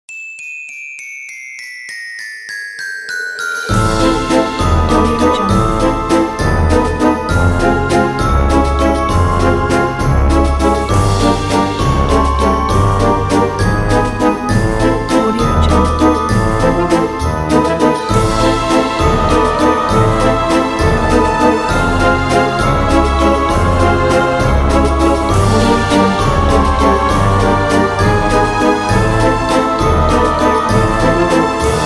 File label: crazy circus